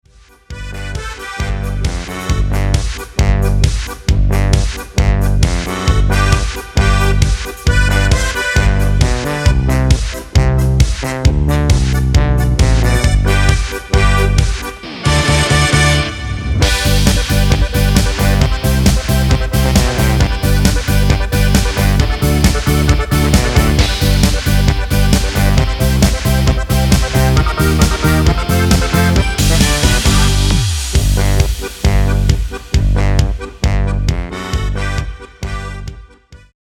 G DUR ohne CHOR

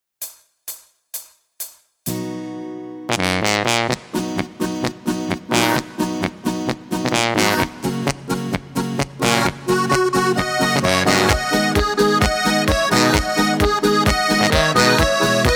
H Dur Karaoke ohne Chor

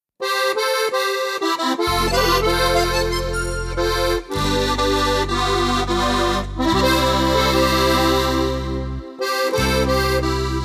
GCFB ohne Chor

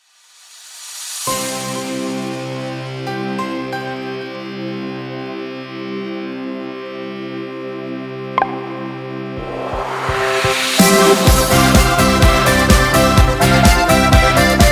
C-Dur Karaoke mit Chor

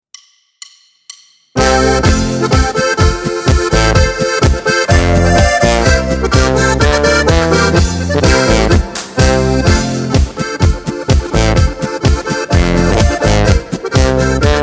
Karaoke ohne Chor

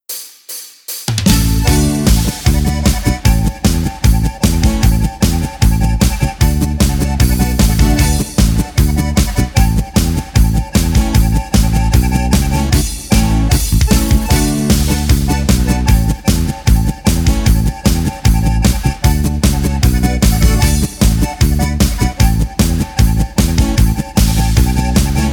Polka mit Harmonika Begleitung

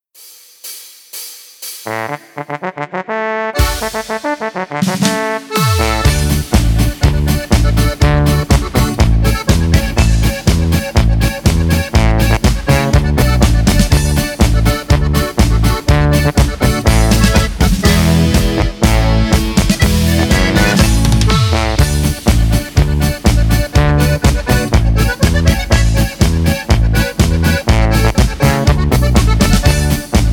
Marsch A D G C ohne Chor

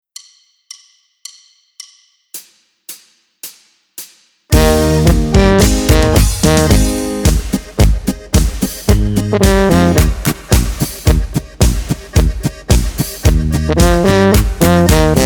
BES AS DES mit Begleitung

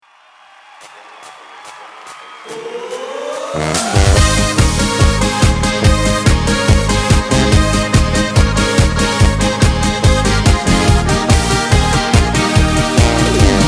Karaoke mit Chor

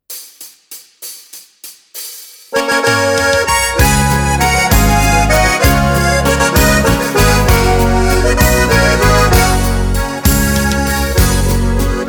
Karaoke mit Chor

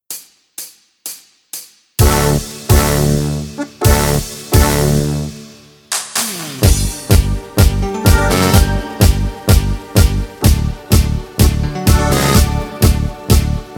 G ohne Chor

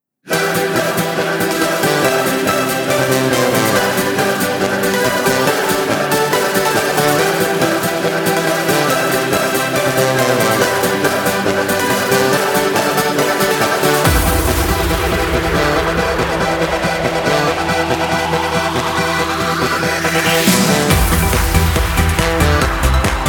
Apres Ski G Dur Karaoke mit Chor

